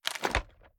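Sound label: sniper reload empty fast end; gmod; iw8; xmike109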